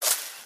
scorpion claw; minecraft; technic

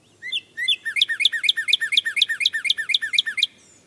bird red; minecraft; technic

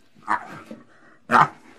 cub grunt; minecraft; technic

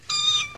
kitten grunt; minecraft; technic

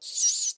mice dying; minecraft; technic

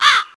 bird black; minecraft; technic

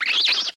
rat grunt; minecraft; technic

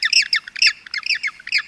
coast bird, wildlife; minecraft; technic

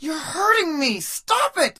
were human hurt; minecraft; technic

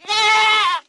goat dying; minecraft; technic

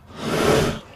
lion hurt; minecraft; technic

